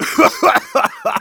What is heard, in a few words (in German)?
Engineer laugh short